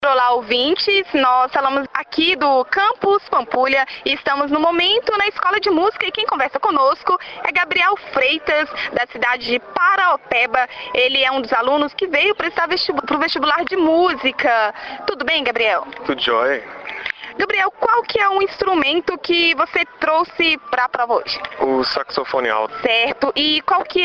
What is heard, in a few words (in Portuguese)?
saxofone; studante de paraopeba presta vestibular para musica